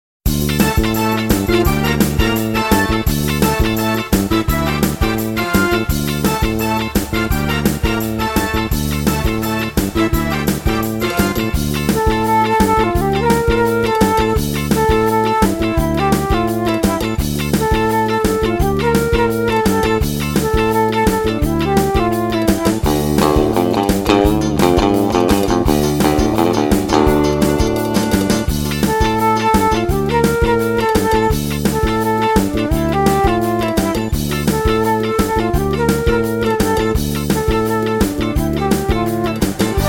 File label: flute solo